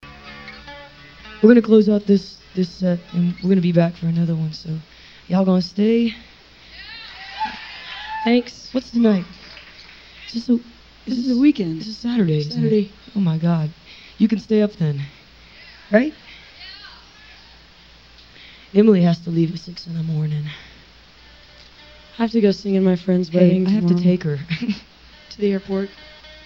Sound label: crowd talk; speeding ticket 3